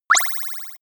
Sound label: beaming; energie